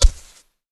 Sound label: step; zoochosis; panda